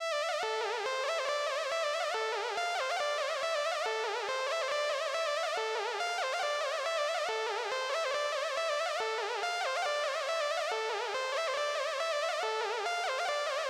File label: LEAD